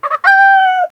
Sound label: a single long cluck of, farm